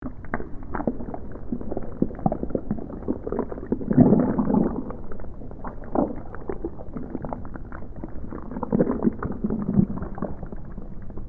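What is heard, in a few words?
Ambience Infestation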